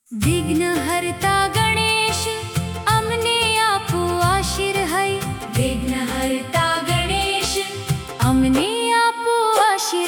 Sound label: gujarati vighnaharta ganesh, ringtone